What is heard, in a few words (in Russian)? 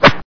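замах клюшкой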